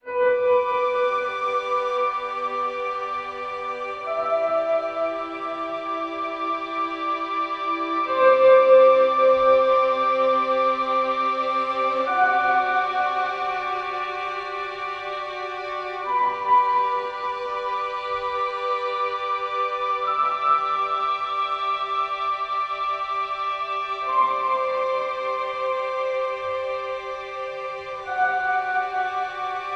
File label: Heartache Ambient